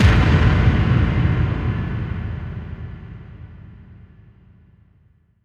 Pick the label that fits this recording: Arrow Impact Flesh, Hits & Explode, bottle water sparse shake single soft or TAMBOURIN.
Hits & Explode